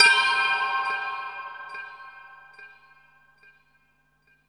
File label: Synth Bells; Dream Zone